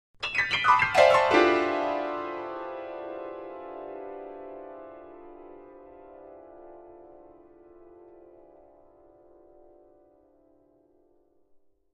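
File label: Grand Piano Descending Chords; keyboards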